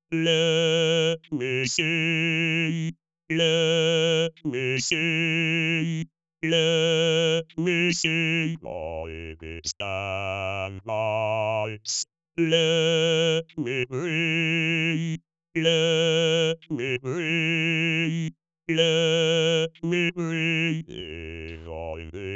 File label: bass verse; KTH